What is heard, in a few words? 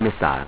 missile; JSF demo